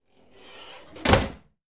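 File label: Dishwasher Door Open; appliances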